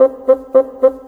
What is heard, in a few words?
Bassoon, Cinematic